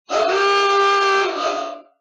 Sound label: AWOOGA